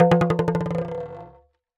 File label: Talking Drum Bounce; Percussion Samples